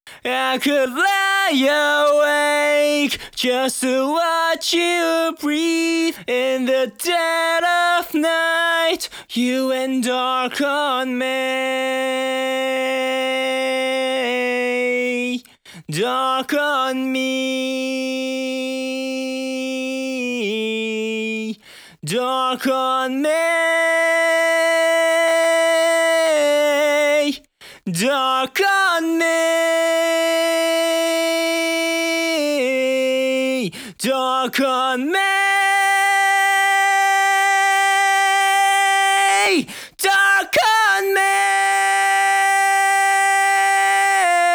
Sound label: Cover Dry, vocal